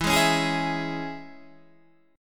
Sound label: E 6th, down, Guitar, Open D